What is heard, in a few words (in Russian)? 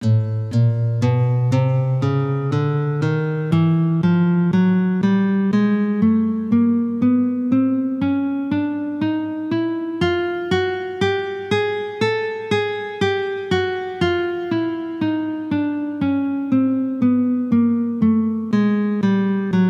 Hromaticheskaya gamma